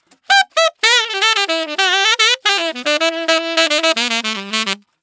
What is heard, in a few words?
110 NuJazz Sax Emaj; Digidesign; Pro Tools; Pro Tools Essential Loops